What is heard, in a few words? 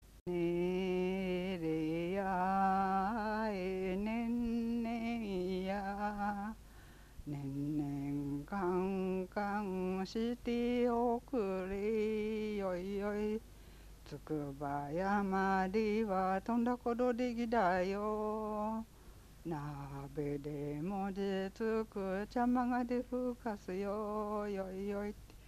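lullaby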